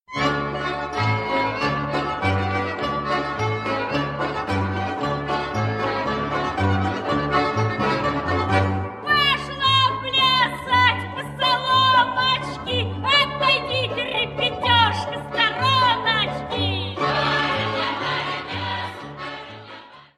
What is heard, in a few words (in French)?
Barinya - Russie